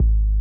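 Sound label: Bass Drum Loop; Yamaha DJX-2B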